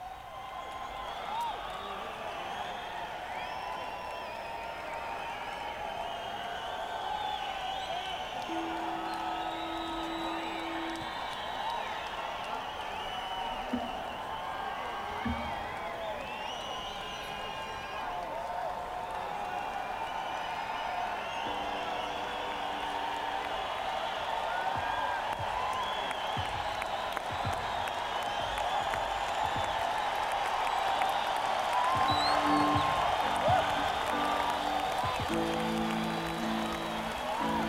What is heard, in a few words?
Encore Break